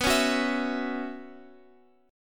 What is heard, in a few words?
B Suspended 2nd Suspended 4th, Guitar, Open F; Bsus2sus4; strummed; x,x,11,8,9,11